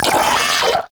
Arachnoid Vocal Pain; Warframe; Corpus; Arachnoid Hunger

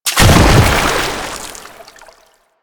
Bodyfall Water; Enemies; Warframe; Proto Infested

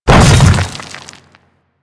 Hammer Boss Land Dirt, Footsteps; Warframe; Grineer

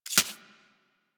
Arachnoid Micro Step Snow; Warframe; Corpus

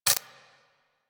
Briefcase Akimbo Pistol Mech Low Clip; Warframe; Weapons; Corpus

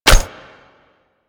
Shrapnel Shotgun Mech; Railjack

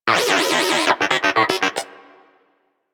Moa Inquisitive Vocal Player Down; Warframe

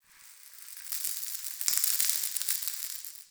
Cave Dirt Trickle, Ambience; Shrine

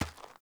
Walk Gravel, Footsteps; Characters; Enemy; Mockeries

